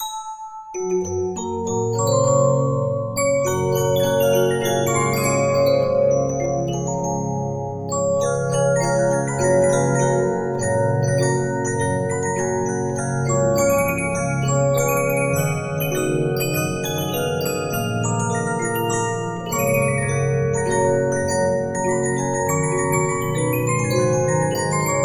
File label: Regina, 15inch disc musicbox